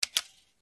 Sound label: MP5 mag out; Zomboid; VFE